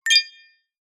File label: multimedia alert notification glassy flick short